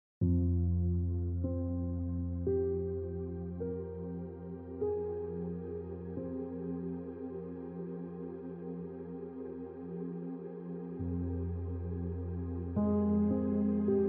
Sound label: Piano Music